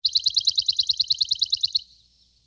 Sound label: Morning Bird; Xiaomi MIUI-9; alarms